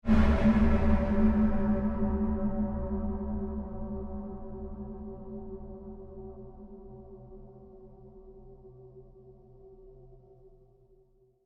Created Surge Fade Slow, Rise And Dive Sound Effects; sound building blocks created through manipulation of raw material